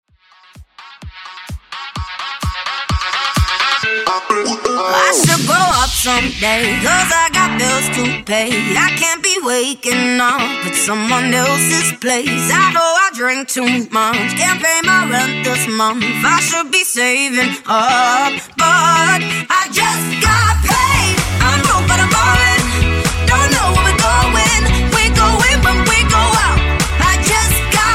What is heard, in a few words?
Melbourne Bounce Banger